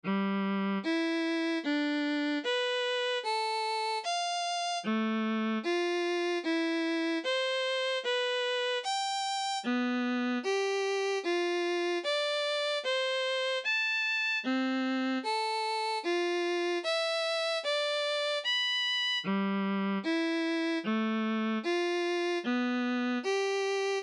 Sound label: sixths